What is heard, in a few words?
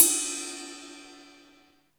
PING RIDE; THE GENERAL